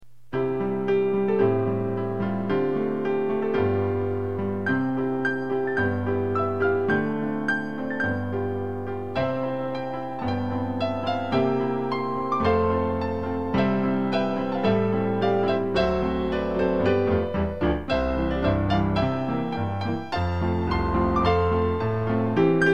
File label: Duet